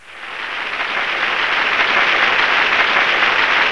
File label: Applause; GMTones